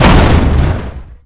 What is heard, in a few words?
gun fire; gravitygun